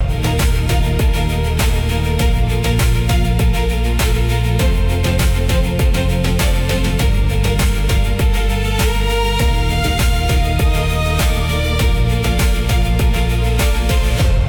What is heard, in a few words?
loop